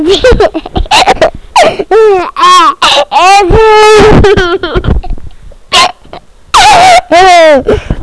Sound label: laugh